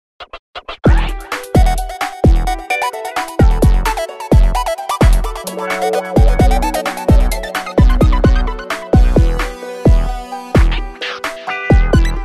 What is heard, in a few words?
Melody, Ring; Doro Liberto 820 Mini